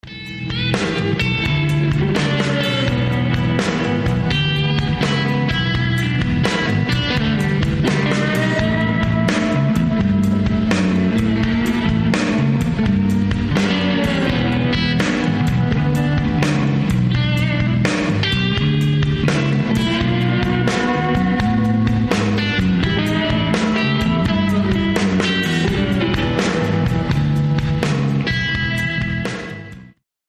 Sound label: Strung Out Blues